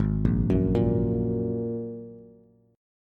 G sharp 7th Suspended 2nd G sharp Bass; G#7sus2 arpeggiated; 4,1,4,3; JGuitar

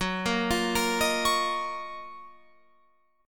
F sharp Major 13th Guitar Open F; F#M13 Chord; arpeggiated; 18,17,17,17,18,20

